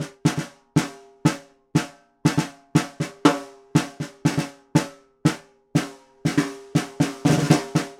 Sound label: Vintage Ludwig Snare, Percussion